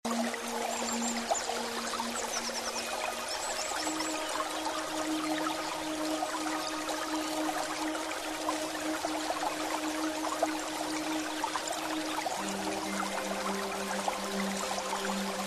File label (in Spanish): Musica con melodia